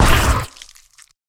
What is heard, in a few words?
Enemy Death; MiSide